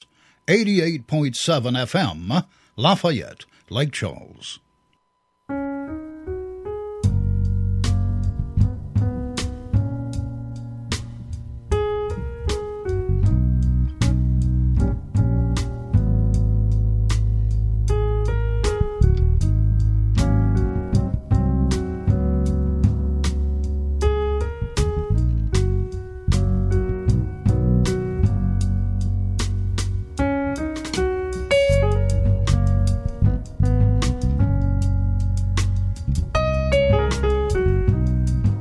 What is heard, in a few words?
jazz